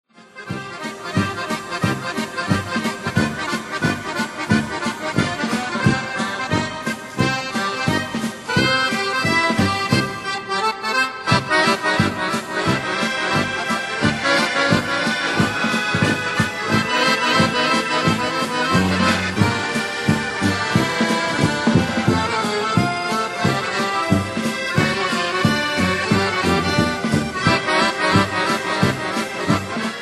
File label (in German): Akkordeon